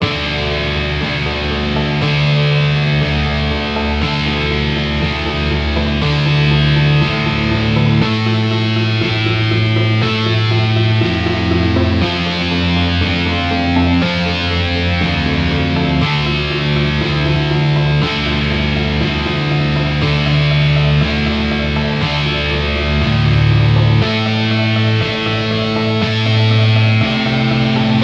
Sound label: Generative Boomp, no drums